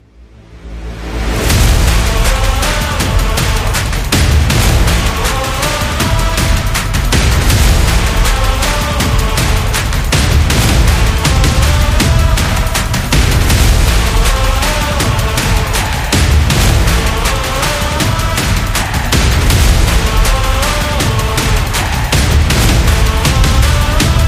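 Stadium Anthem, sport percussion and stadium anthems; football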